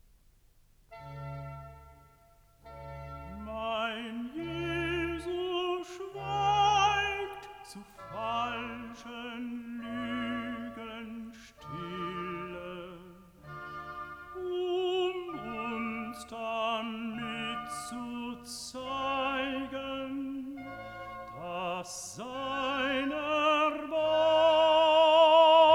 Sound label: Recitative (Tenor); Mein Jesus schweigt zu falschen Lügen stille; Bach Matthäus-Passion BWV 244; Karl Richter (conductor)